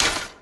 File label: menu open